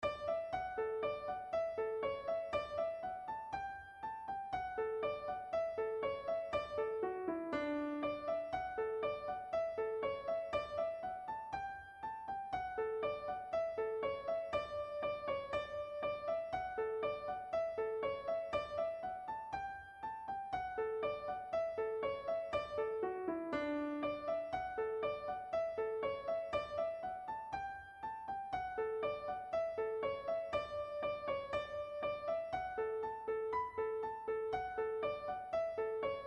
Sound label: midi; michael gregorys; A midi version of the bare bones of the tune